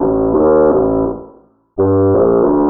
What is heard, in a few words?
bass monotonal, Jazzmatazz Loops